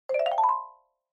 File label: Xylophone Slow Short Up